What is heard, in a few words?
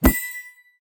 booster appear 出现